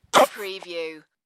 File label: Baby Grunt